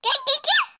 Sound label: Die; MilkChoco